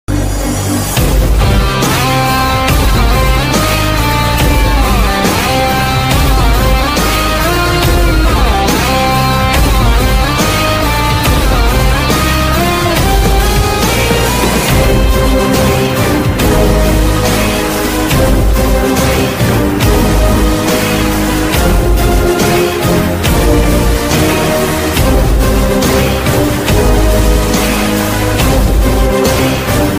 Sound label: mass movie impressive bgm ringtone theme song; surya